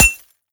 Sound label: glass, bullet impacts; physics; Half Life Model Pack